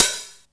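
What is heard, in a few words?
HIHAT HALF OPEN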